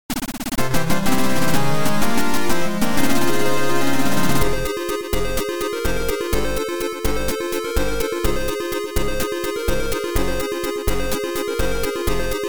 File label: Fanfare; Final Fantasy VII (PS)